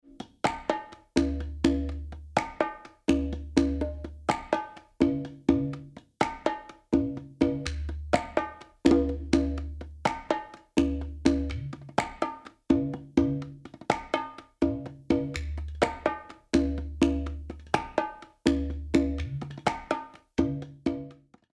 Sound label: Bolero Statico, Latin Percussion